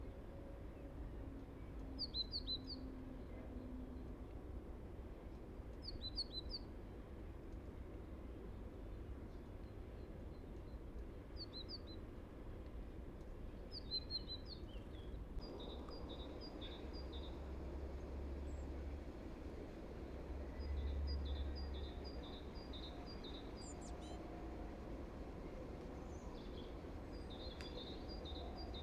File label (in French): mesange charbonniere